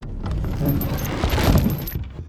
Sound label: Ram Attk, Onager, Ballista, Ram